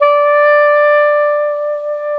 SAX D3, SAXOPHONE; USB Soundscan vol.63; Balkanish Traditions; AKAI

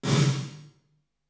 REAL ROOM

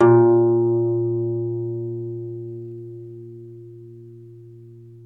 CELTIC HA, Celtic Harp

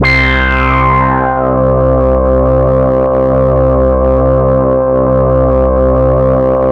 Oscar bass

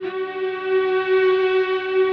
breeze, guitar pads; Spectrasonics; Bizarre Guitar

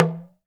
TALK DRM, Heart of Africa; Spectrasonics

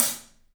Paiste hat, drums; 3D Sound

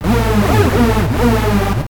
AMBIENT

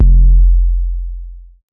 Dusty 808 F, hip-hop drum kit; 99Sounds x Monosounds; Moon

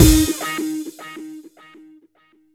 Hit, Roland JX-305

